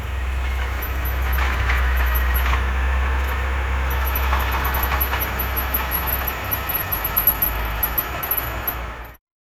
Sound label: the sound of a tractor; haunted